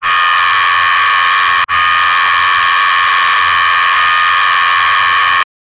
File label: futuristic blaring alarm